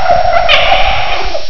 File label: die; snapbug